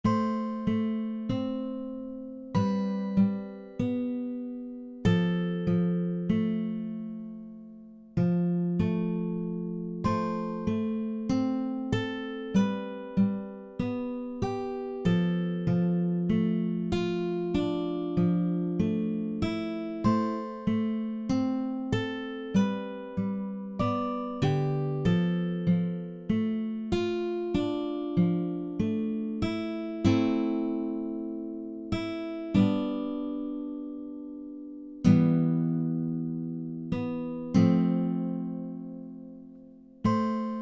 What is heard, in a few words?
Guitar; Next Day Survival